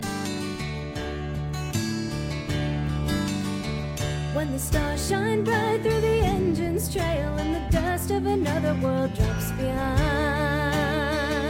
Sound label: song; firefly mals